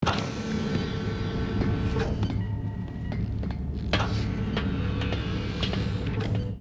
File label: office doors